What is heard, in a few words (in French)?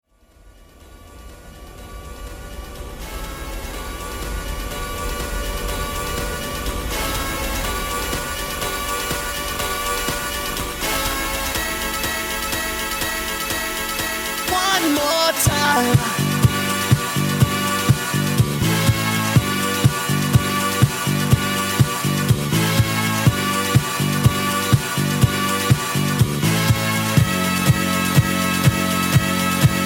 la musique electronique